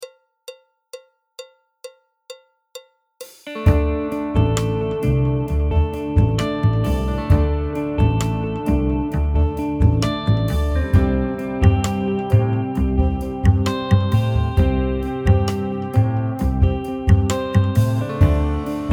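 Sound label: backing track, Jazz; alto, trumpet, trombone, piano; Jazz Syllabus Level 2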